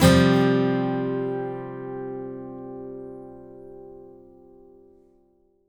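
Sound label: acoustic guitar C sus up, Whole Lotta Country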